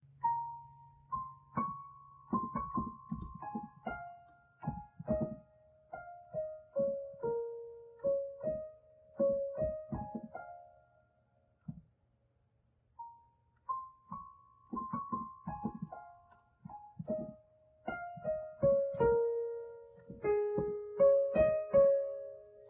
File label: fan song piano; Underhero